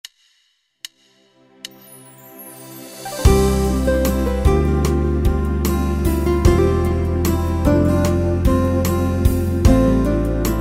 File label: Worship Slow, Yamaha PSR-3000 Factory Styles